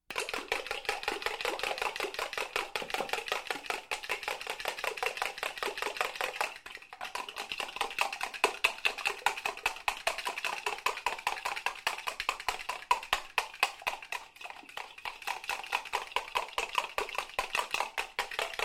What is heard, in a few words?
Beating Eggs in a bowl